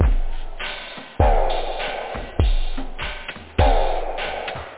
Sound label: laser, house; OctaMED SoundStudio V1